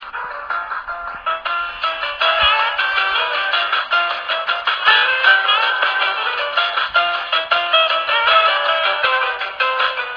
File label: theme; newsradio